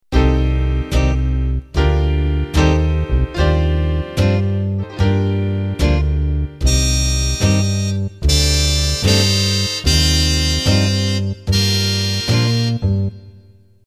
Band Intro

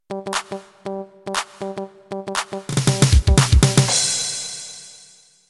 Hip Hop Intro

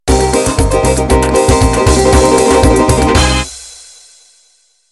Gypsy Pop End

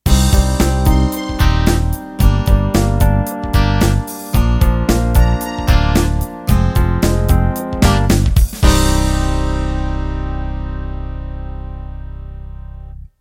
Pop Ballad End

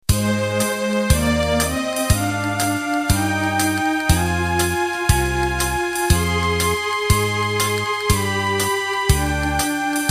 Broadway End

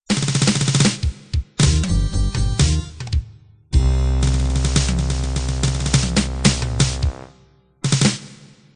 up swing end

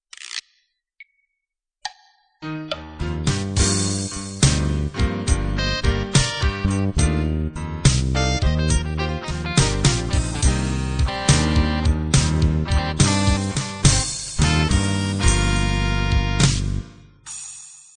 slow blues intro

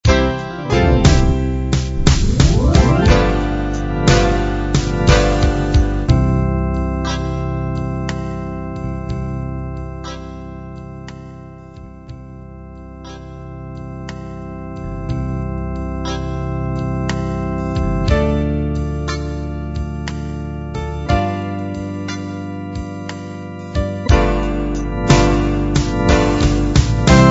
3-4 gospel

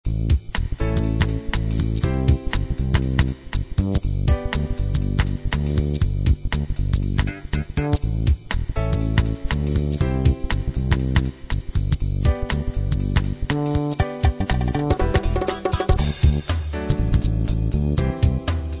acid jazz

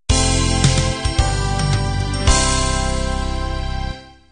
windy beat end

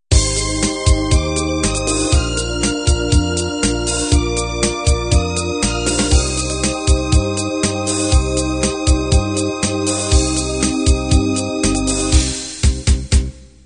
8bt analog end

